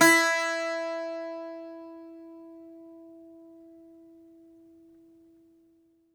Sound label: Greek Bazouki, plucked strings; E-MU Producer Series Vol. 7 – Old World Instruments